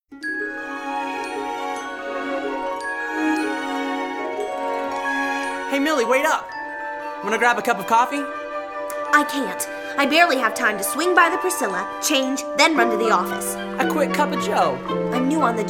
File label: Morning Music